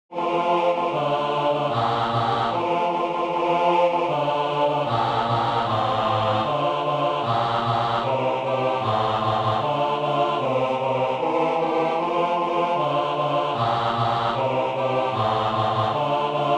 Bass; Maiteli; Schweiz

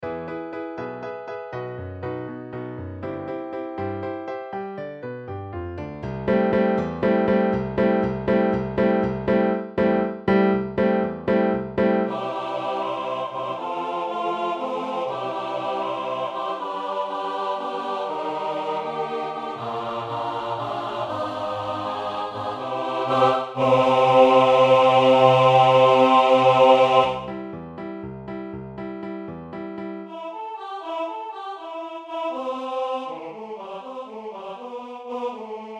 Tutti; West Side Story

